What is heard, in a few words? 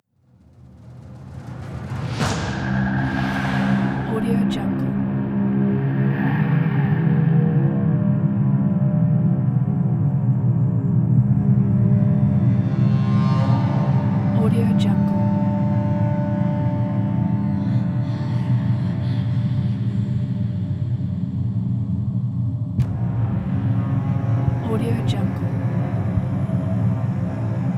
dark nordic trailer